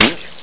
water balloon

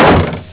door slam

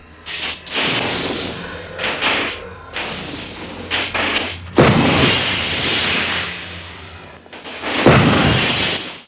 electric short

